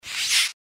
giant rat pant; npc

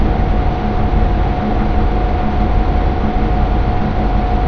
belt loop

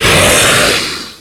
attack

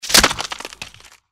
mantis death; npc

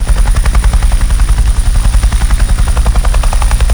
helicopter

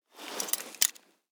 enter, lockpicking

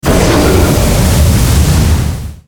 magic dragonfire

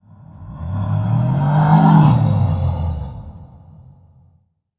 mad vox; gundyr

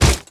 step run

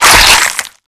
strike hit

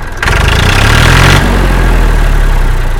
crusher ore added

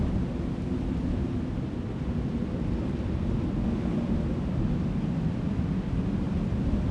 wind loop; soundscapes

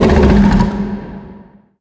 pain; cyberdemon

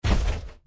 run, power armor; clothing system

